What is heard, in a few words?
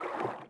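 water walk, footsteps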